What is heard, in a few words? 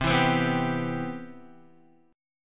C Major 7th, Guitar DADGAD